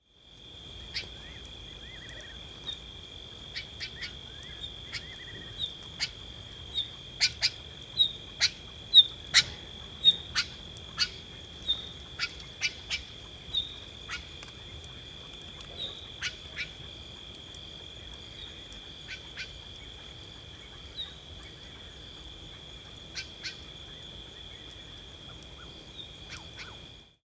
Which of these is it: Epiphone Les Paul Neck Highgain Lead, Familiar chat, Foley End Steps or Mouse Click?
Familiar chat